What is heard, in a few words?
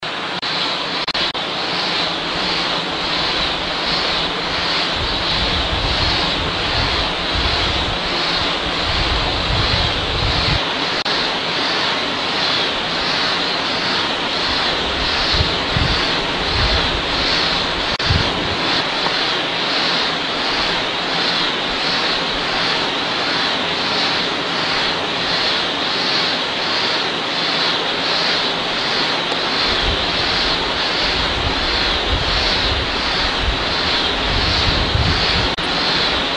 wind turbine